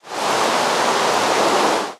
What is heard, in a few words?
rain, ambient weather